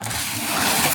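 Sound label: Handwriting Water Colour Canvas; soundbits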